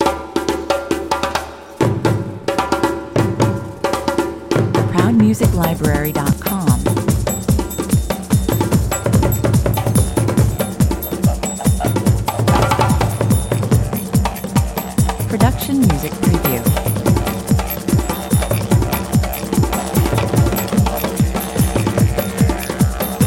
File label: tribal, 73 bpm